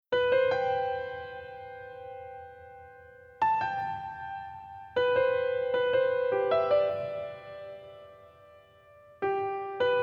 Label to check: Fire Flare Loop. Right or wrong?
Wrong